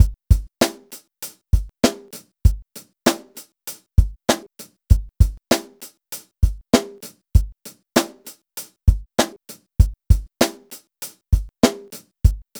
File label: Drum Kit, Electro Lounge; Sonic Foundry (Sony Creative Software); 098 Savvy